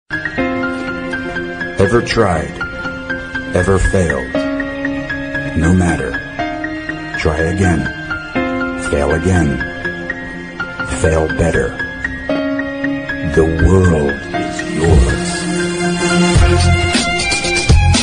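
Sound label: background music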